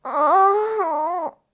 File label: moan; Whorblox; ROBLOX GAME ASSETS